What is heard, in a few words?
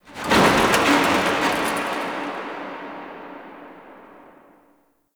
Stadium Metal Crash; Warframe; Ambience; Nineteen Ninety Nine